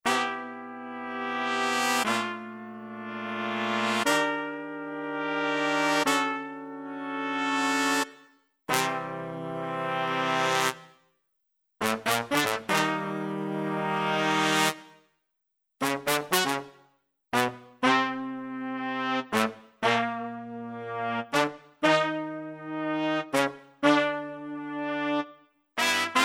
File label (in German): phrasen pop